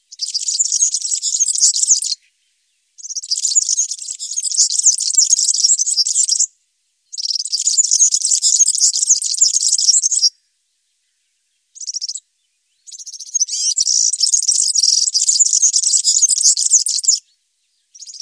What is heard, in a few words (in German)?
Girlitz